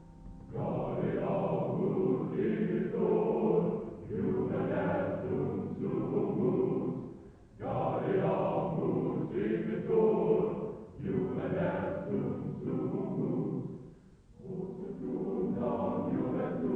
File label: Gaudeamus Igitur, Recording of Gaudeamus Igitur by the; South Bend